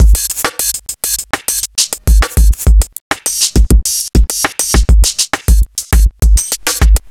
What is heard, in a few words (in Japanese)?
Limiter 2 比較 Logic limiter; BUTE